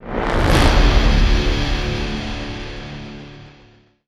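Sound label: sting, guitar quick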